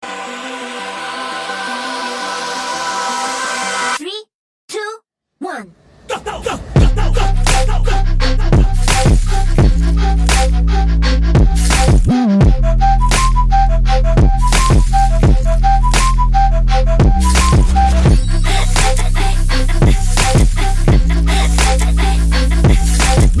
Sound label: Trap; Stranger Things